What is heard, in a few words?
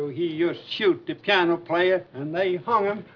piano; He yust shoot the piano player...; Western Movie Wavs